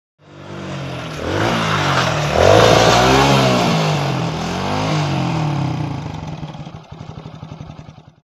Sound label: Stop 125 cc Motorbike; dirt bike 100